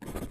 scribble; Barotrauma; More Level Content

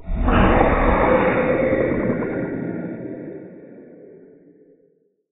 death; Barotrauma; Barotraumatic; Abyssalcrawler

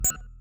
UI msg; Barotrauma; Subsurface

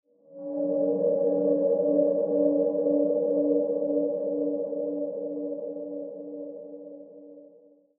sonar Ambience; Barotrauma; Real Sonar

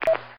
radio msg; Barotrauma; Subsurface

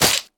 limb slash; Damage; Barotrauma; Subsurface

